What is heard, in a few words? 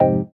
synth, Roland, dance synth; Sound & Vision - Gigapack I CD 2